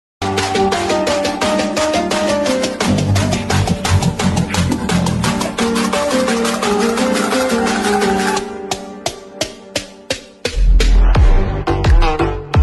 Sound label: attitude ringtone bgm ringtones english ringtone